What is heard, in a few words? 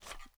m3s90 get ammo; weapons; garrysmod; m3s90p